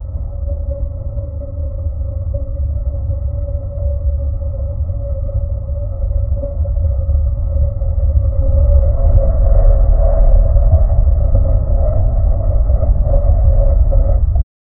the deep mechanical hum; disaster

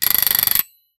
machines

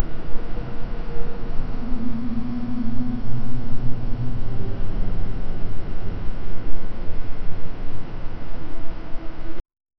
dark ambient hor, creepy; 5 second

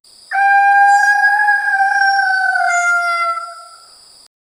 the sound of a rooster; bird

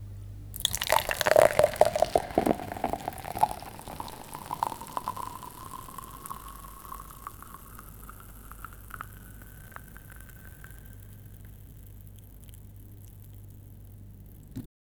pouring traditional arabi, food and drink

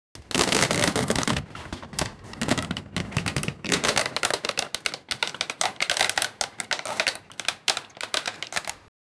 accident sound

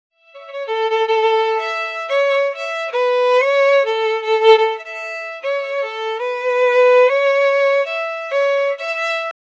violin; string instrument

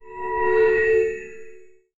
magic

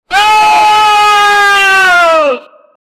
an animalistic cry of som, human voice

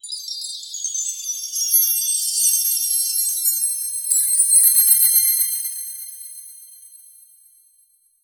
happy sparkling rainbow, bright

